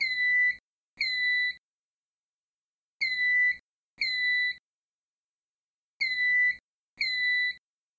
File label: Panel Chirp Supervisory; EST3